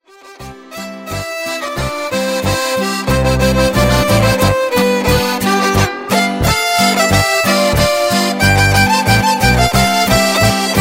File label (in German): geigenboarischer